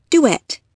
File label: duet